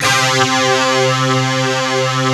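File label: distort, Fairlight; East West; Global Sounds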